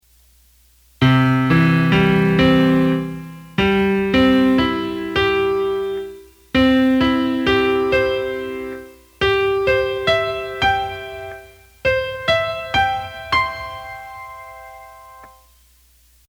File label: ketron Grand Piano